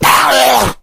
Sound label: rage at victim, infected, male